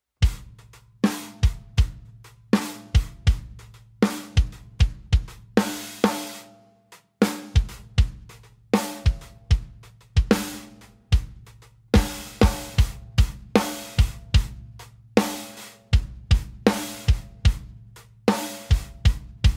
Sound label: MEINL DEEP